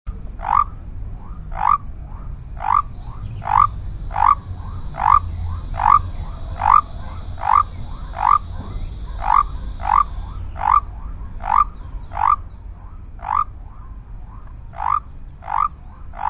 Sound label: Leptodactylus mystaceus